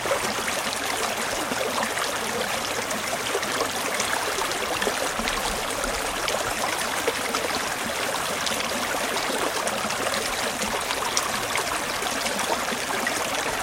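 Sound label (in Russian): быстрый ручеек который вырвался из леса и скатывался по склону усеянному мелкими камнями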